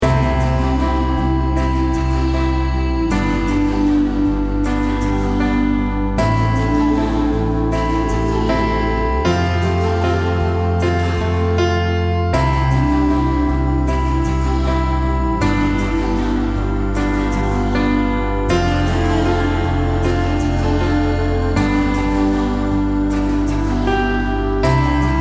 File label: Healing Melody